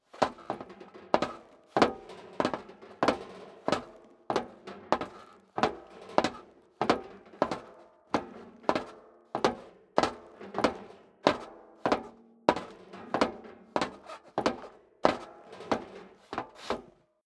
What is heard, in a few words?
step boots metal rattle downstairs sequence; schritte; Footsteps